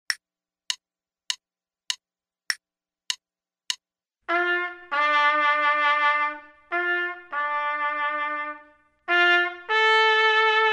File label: trumpet; Selected Duets for Trumpet Podcast